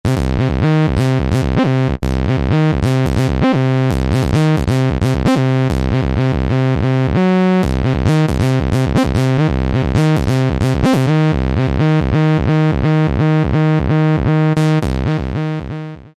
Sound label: TB Saw Bass; Roland SR-JV80-11 Techno Collection